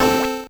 core squish A2 C2

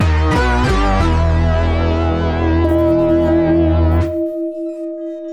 Winning Jingle; Slinki

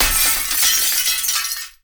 glass break

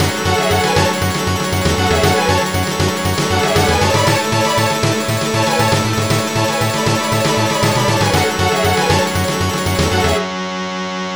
Story Mode Character Theme; F-Zero Climax; GBA

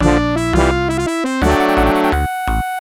Level Up; Underhero

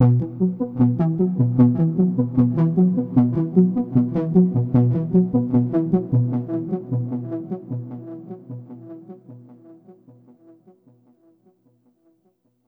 Overlay Tone

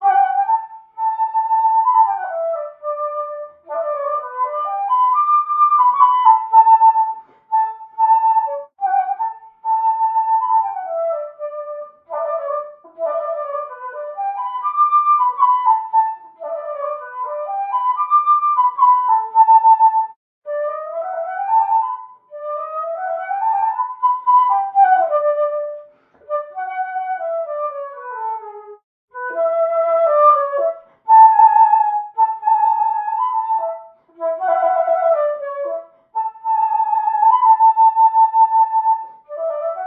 Wind Instrument; assassin s creed syndicate

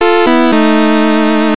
Fail Fanfare

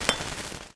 Bead Pickup; Levels Hub Swamp

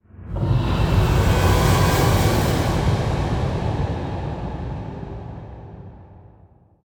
Stinger; PlagueOfWrath; Hearthstone: Heroes of Warcraft; Android

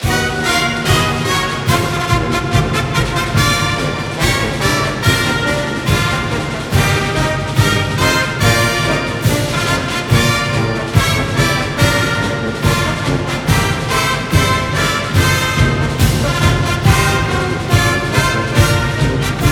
Broadcast Fight Song; NCAA Basketball 09; PS2, PS3, Xbox 360

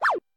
Hammer

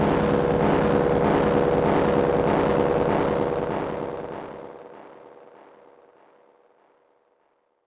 Overlay Dark

